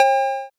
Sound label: cowbell, drift phonk